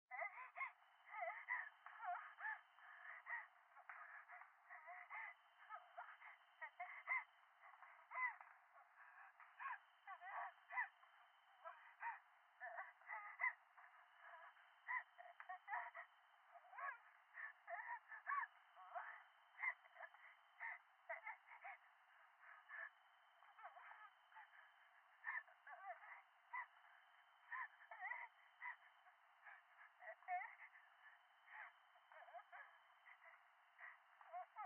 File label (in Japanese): 喘ぎ 山奥